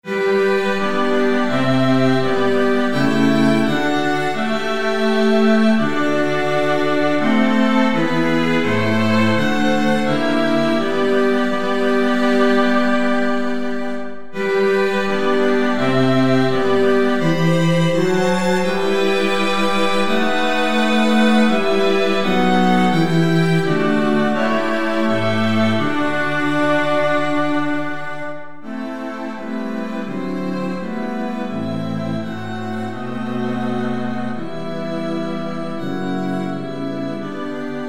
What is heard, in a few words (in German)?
streichquintett